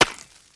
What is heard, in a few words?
Ice Collision, Ice Blocks; fowl